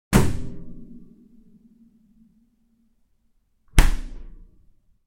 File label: Refrigerator opened closed